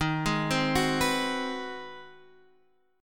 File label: G sharp 7th Sharp 9th D sharp